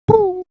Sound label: Beatbox; AMG